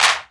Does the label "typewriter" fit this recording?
No